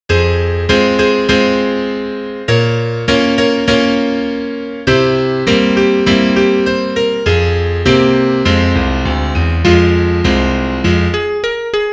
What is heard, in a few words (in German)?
Klavier Solo, trompete; Duett in g - Moll